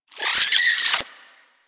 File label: vocal death, critters; Warframe; Hunting Critters; Nexifera